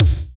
BD, BD Dance; Sound & Vision; Gigapack I CD 1

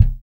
6-STR FUNK, bass; Spectrasonics; Bass Legends

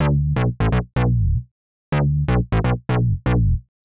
125 Synth Bass; PowerFX - Nu Jazz House

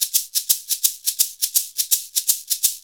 SHK, Shaker; Univers Sons; Basicussions

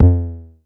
Bass bd, Jomox Airbase99

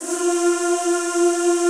ZZZ F3, Classical Choir; Best Service; Extended Classical Choir

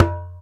Ashiko Gun; EMU Planet-Earth

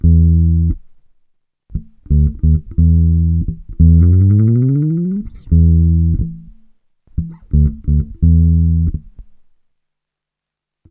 FUNK 88, Bass Grooves; USB Soundscan vol.30; AKAI

